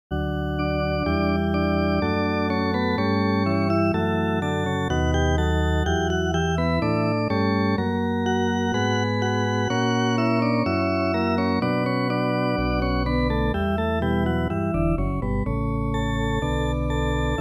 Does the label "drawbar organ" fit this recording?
Yes